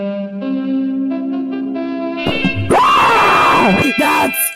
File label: scream; screen gems